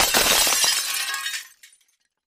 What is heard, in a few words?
Glass Crash; windows & mirrors